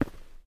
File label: ROCK, steps; Terrarum